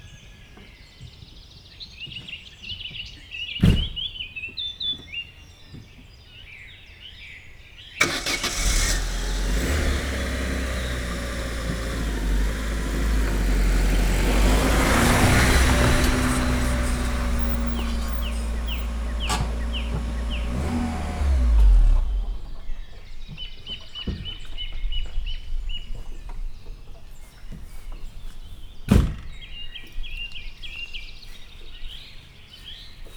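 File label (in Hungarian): autó valal előre áll, autó opel; cardioid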